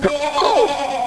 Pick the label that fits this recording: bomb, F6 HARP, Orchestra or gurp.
gurp